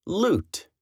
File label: lute